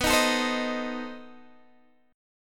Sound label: B 7th Flat 9th, down, Guitar, Open G